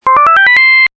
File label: Rise Rate Attentive; dexcom g4 platinum